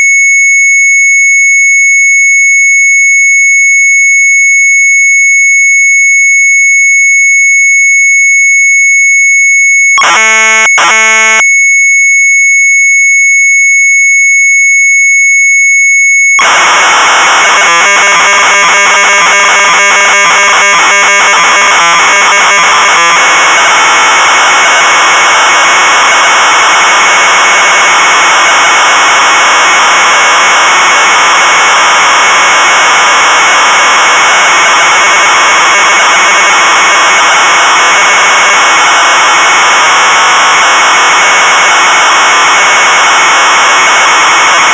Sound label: MZ800 Tape, sharp; MOONLANDER; retromelsarchive